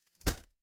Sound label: impact slam